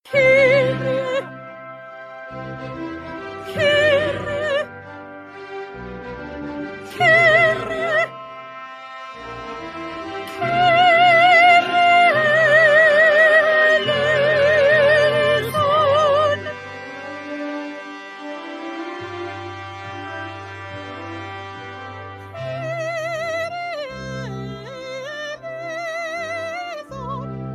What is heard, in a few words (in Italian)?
soprani kirie